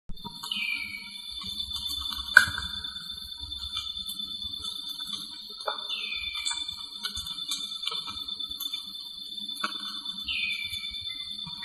Hypoedaleus guttatus; Reserva Surucua; Jorge La Grotteria

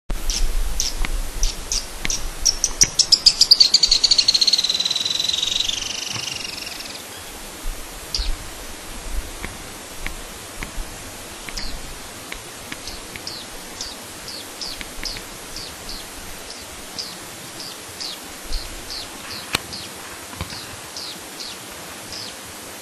Asthenes baeri; PP Chancani

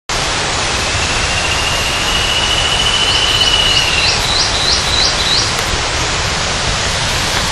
Hypoedaleus guttatus; PP Esmeralda; Jorge La Grotteria

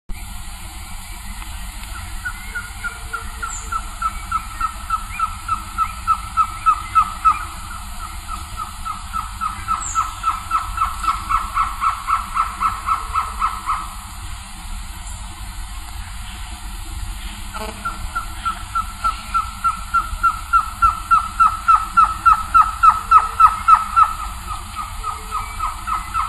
Trogon surrucura; PP Cruce Caballero; Jorge La Grotteria

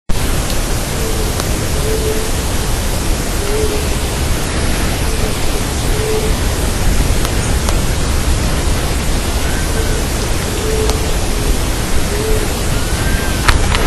Leptotila megalura; RP EcoPortal de Piedra; Jorge La Grotteria

